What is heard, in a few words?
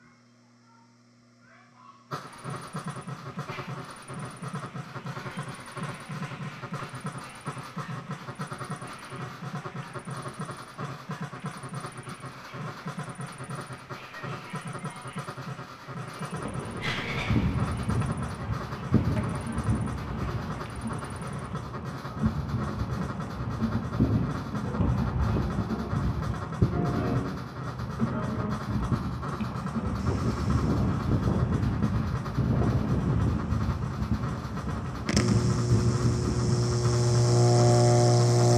Noise Experiment for Two Amplifiers Guitar and Limitless Amount of Field Re